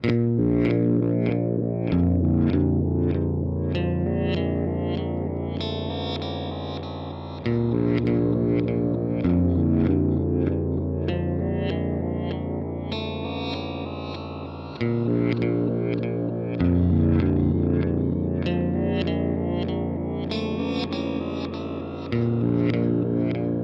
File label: Red Panda Raster V2 Shift Frequency